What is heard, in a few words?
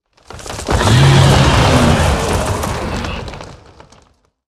Drake Death; Chia Drake